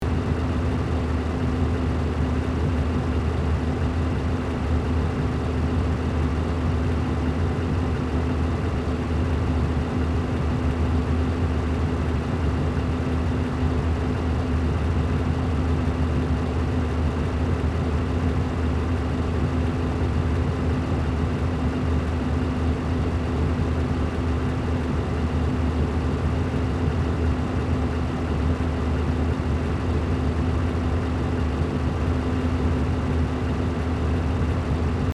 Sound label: Big truck engine steady running loopable